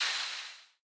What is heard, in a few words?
Disc Rico Tree; boomerang fu